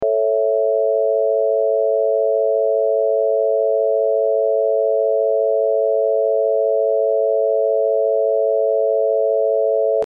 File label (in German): Dur-Dreiklang